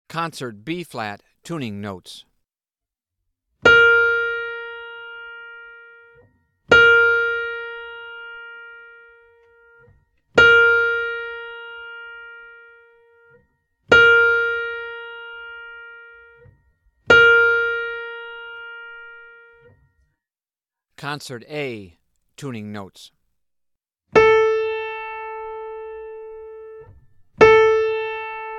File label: tuning notes